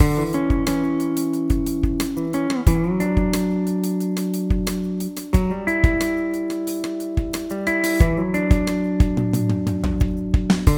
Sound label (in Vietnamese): nhac phim mo dau video nhe nhang